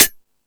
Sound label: High Hat, Drum Machines